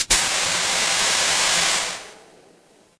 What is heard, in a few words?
fire electric fuse